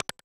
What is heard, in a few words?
Tile; Kabuki-chou Reach Mahjong Tonpuu Sen; SNES